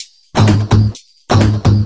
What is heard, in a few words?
Electro Loop 128BPM; Vengeance Samples